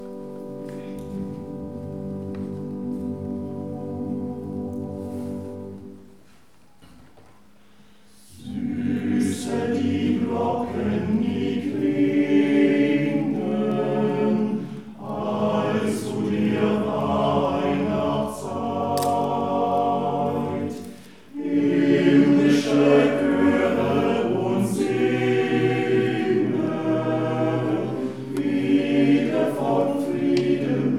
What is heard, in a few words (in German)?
M-Chor; Adventskonzert 2017; Süßer die Glocken